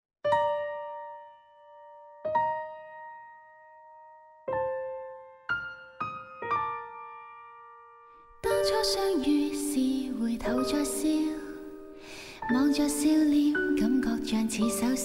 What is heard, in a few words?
dnse normal; samsung yp r0